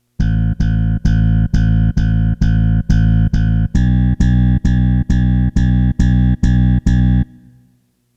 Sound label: bass